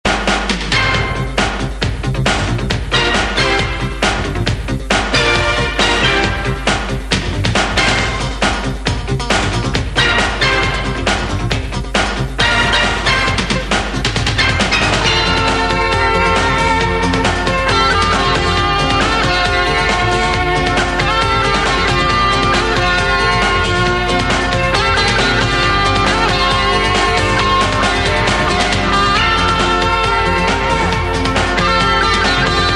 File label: jingle; tele5; tv total